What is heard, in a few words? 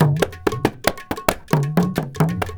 India, Heart of Asia